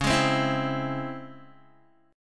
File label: Db Minor Major 7th Db Guitar; DbmM7 strummed; JGuitar